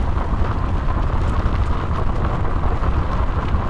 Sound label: Auto Tires Dirt Loop; Automobiles